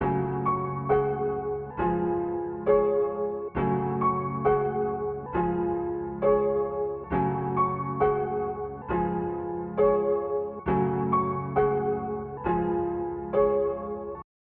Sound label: Clowns Slow 135bpm Fmin